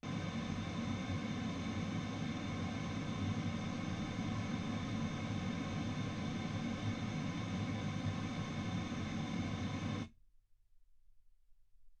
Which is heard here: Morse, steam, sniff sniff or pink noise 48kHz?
pink noise 48kHz